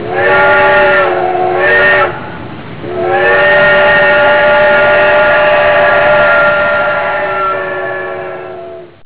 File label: train sound